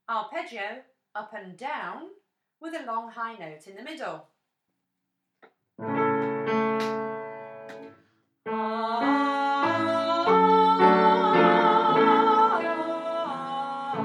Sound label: arpeggios with middle high